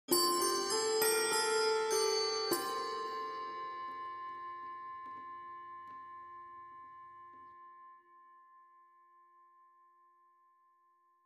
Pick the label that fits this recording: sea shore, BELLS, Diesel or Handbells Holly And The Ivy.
Handbells Holly And The Ivy